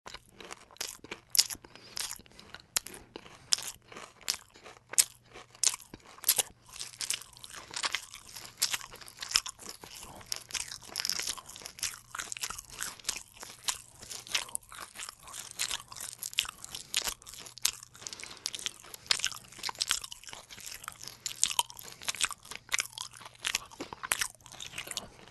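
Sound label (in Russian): zvuk chavkaniya, sound of chomping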